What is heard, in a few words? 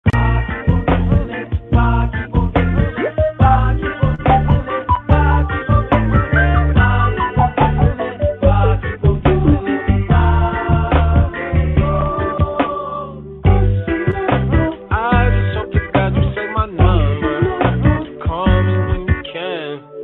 Jingle